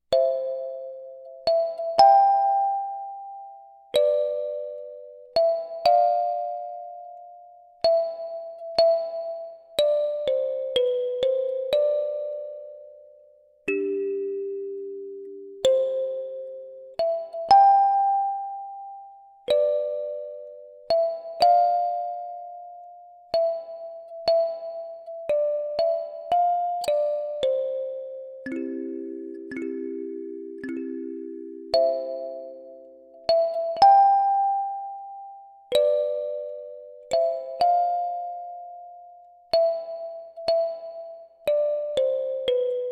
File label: kalimba